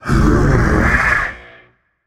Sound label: sigh, voicepack; smite; jorm